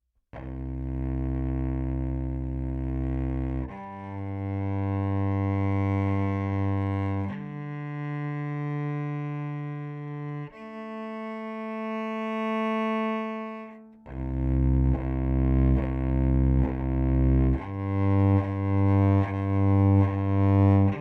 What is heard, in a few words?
Cello Pickup Headway Hi impedance Highs